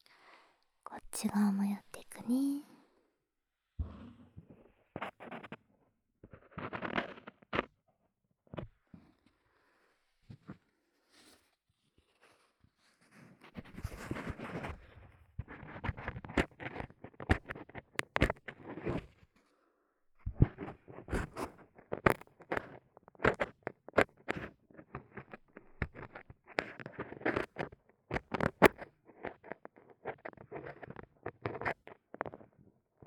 耳かき (左), ASMR; 反対側も耳かきするよ

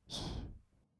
吐く音, ブレス, kawaii voice; ASMR; 1200 kawaii voice!!

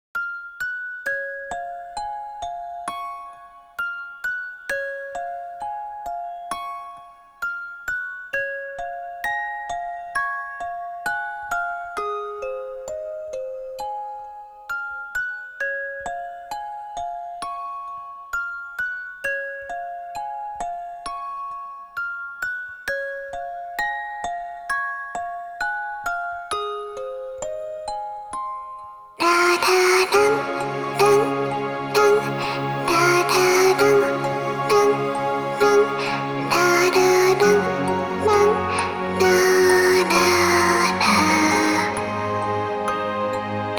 楽曲 子守唄 アレンジバージョン

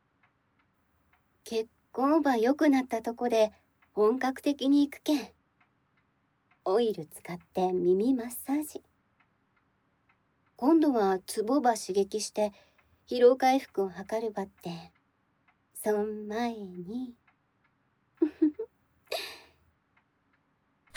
指にオイルをたっぷり塗ってのお耳全体マッサージ。耳たぶから穴の中まで、あますところなくゆったりと～, ASMR; kasanaru makura

